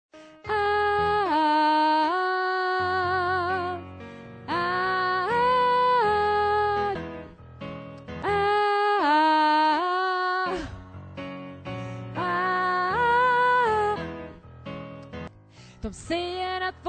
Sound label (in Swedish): vi kommer aldrig att förlora sopran, stämmor